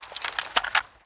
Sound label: gun reloading designed; soundmorph